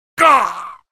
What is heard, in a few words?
pain, male; video games; npc; source engine; episodic; episode 1